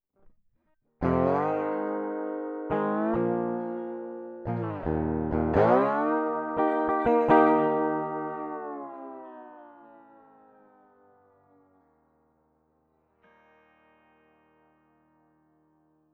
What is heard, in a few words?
Crunchy Blues